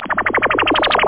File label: Warp; AFiloFaxPro27bSupport